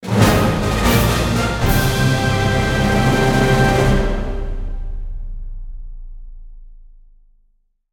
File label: outro Free Spin; excalibur